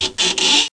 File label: Creak